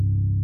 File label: lowpass sample